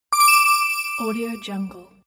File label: small bell